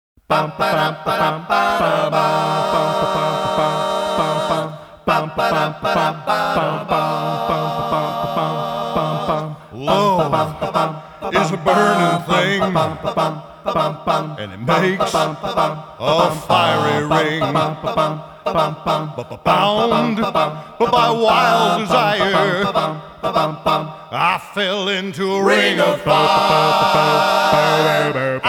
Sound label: A Cappella Doo Wop